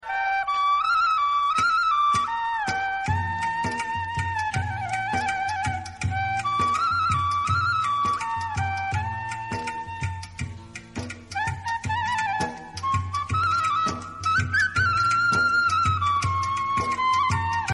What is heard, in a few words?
hero flute